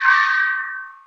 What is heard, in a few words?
Menu Magic